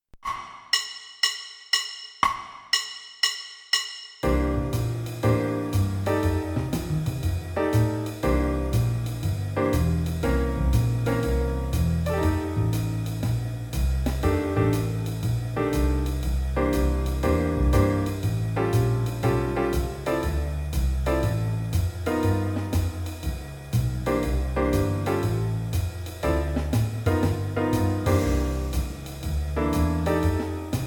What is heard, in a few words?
Play-along Blues in C, qn=120; 4 choruses; Jazz Piano